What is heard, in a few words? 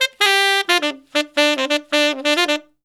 SAX SOLO; Zero-G; Phantom Horns